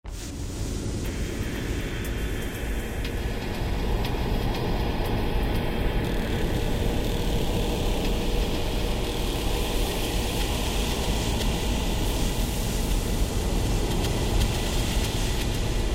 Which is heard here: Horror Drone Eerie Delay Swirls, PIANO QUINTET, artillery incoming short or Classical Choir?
Horror Drone Eerie Delay Swirls